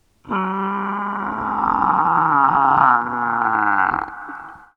Jungle Monster Animal

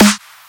Punchy snare Evolution

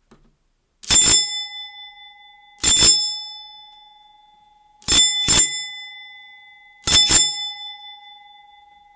Mechanical Doorbell 1950

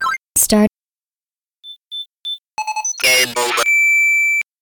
Start And Gameover

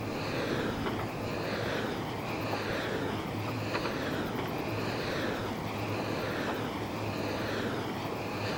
Wind farm blade swish

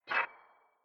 Spell Cast Buff Deep Tone

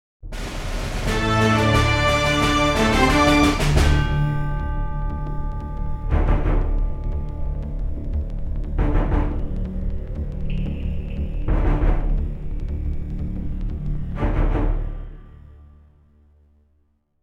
News Jingle

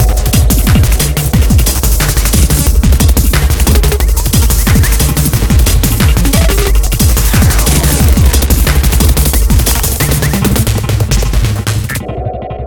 Intense Idm Loop

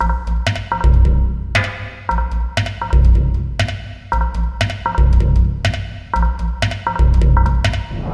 Tribal Beat

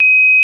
Quindar Tone

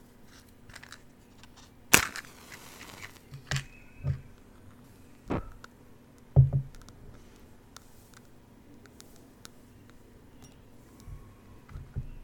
Strike match light candle

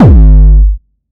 Hardkick

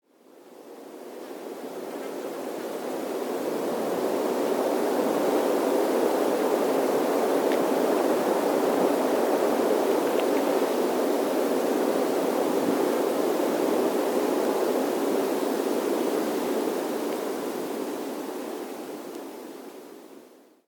Wind

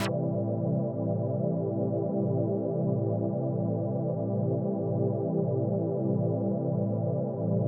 Pad

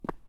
Footstep Stone

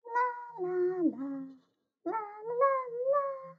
Lalala

